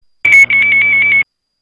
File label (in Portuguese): som confirma